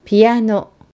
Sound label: piano